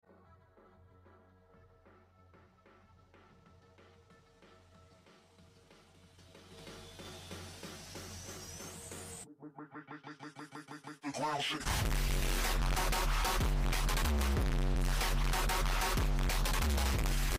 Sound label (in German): krasser bass